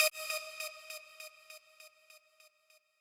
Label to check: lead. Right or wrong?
Right